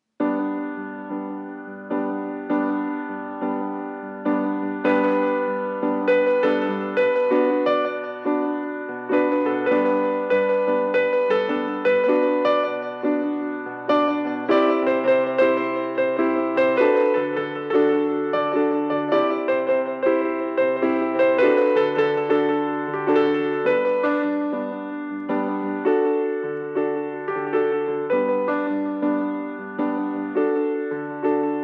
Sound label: quiet piano